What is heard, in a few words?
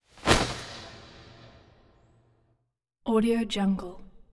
cloth slick whooshes wet